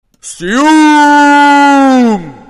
siummmm

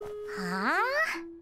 noises; gabriel dropout; satania

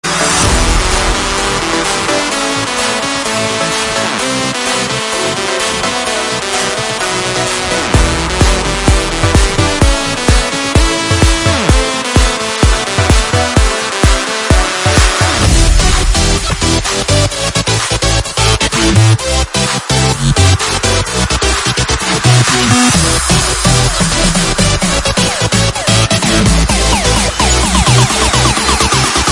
drum and bass remix; smash bros

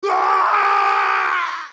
fall scream; mk9; noob saibot

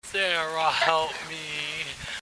falling out of kayak

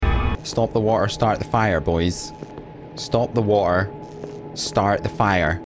fire

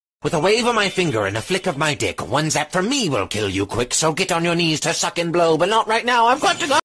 electro rhymes

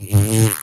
fart sound

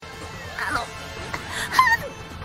polka

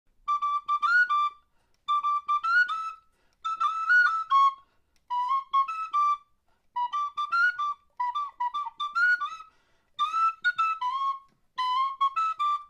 solo tin whistle cover

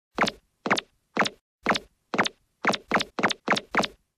choppers gait; one piece

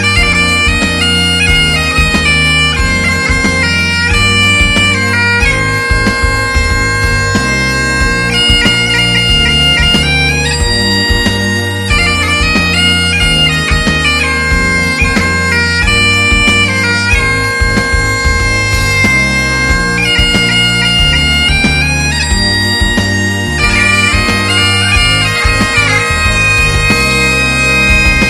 african pipe

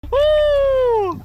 wooo

